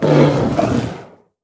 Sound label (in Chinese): 北极熊死亡声